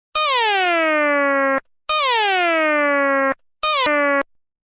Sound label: alert Tone; Abreni